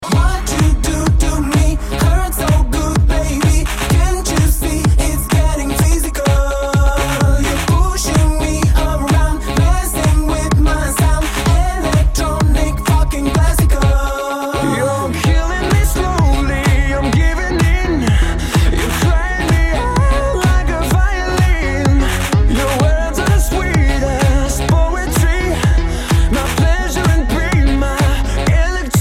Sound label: Electronic Symphony